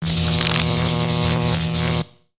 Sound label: Robot Electro Arcs; Lego; ambience nexus tower